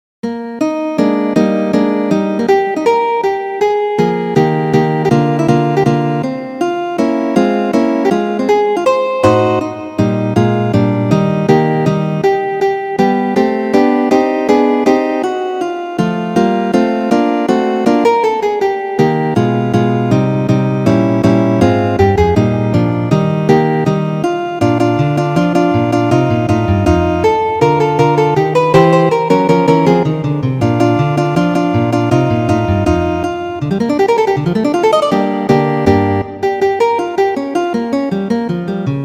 andantes; Ferdinando Carulli

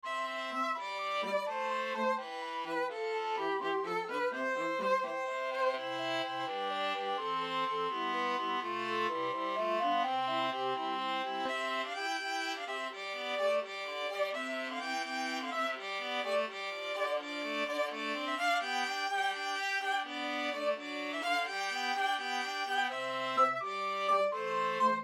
english jig; john dory

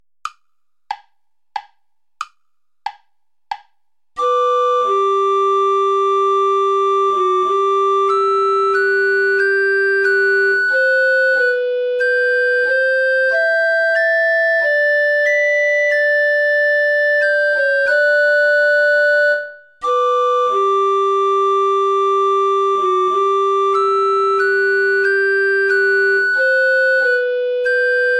sarabande play along without guitar

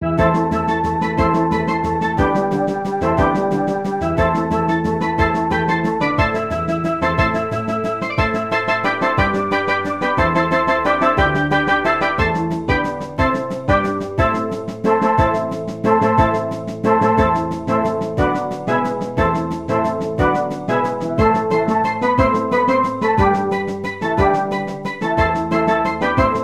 for steel orchestra

